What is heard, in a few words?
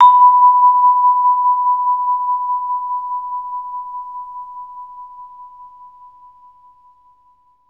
VB VIFS B4-L, VB VIB FS; Best Service; Peter Siedlaczek's Advanced Orchestra